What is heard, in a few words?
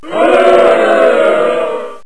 boo crowd